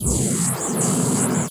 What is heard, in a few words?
Jetpack Use; FPS; Player; FirstMicroGame